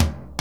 TOM, drum

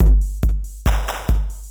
DRUMLOOP TEKNO 140; Techno2

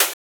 Hat; OrganicTopLoopsMini; Touch Loops

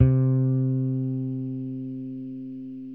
BASS C3, electric bass

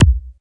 HOUSE BD

